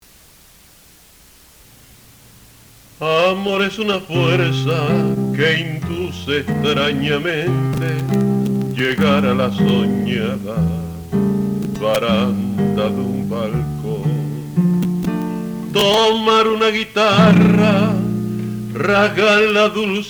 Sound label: tango